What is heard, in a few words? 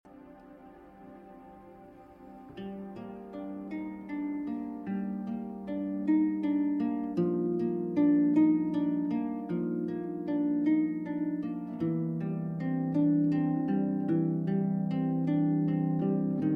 For Harp